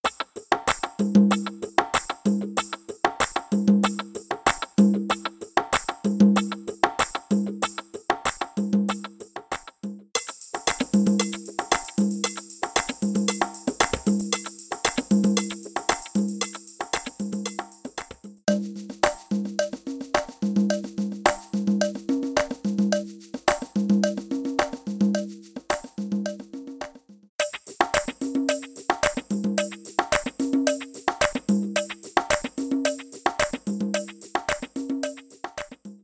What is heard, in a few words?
Percussion Loops